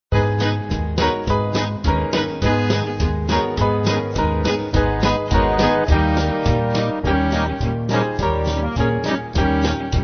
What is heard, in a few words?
Band; Jewish